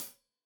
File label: Hat Tight, Hi-Hat, Cymbals; Deeply Sampled Drums